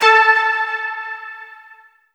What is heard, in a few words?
Plynth